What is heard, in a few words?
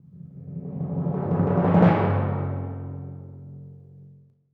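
timpani roll crescendo f#1, percussion; Sonatina Symphonic Orchestra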